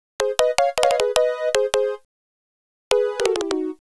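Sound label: Flutes & Swingers Keys G#; Zero-G Kocktail Kollection; Construction Kitz (Lounge); Flutes & Swingers 156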